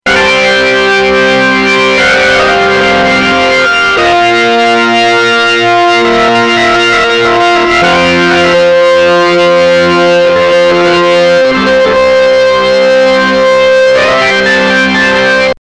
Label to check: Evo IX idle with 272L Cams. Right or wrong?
Wrong